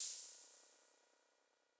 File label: CYMBAL, Sound-Master SM-8